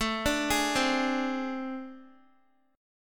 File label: A Minor Major 7th Double Flat 5th, down, Guitar; AmM7bb5; arpeggiated